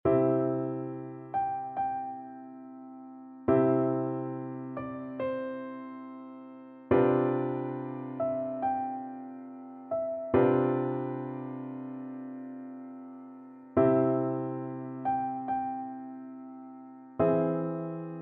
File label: dvorak largo, piano duet